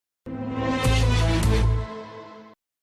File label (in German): BMW Sound Werbung BMW Jingle BMW Soundlogo